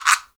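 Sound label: perc afro, gourd guiro; Roland LCDP14 Africa VOL-2; Afro Toys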